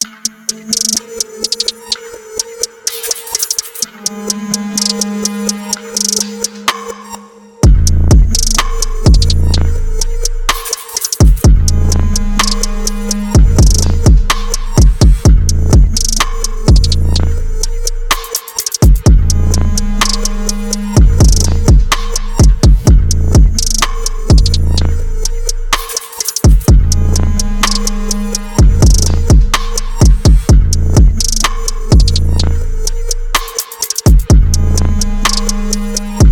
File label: Slowed Remix